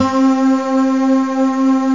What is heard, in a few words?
scifi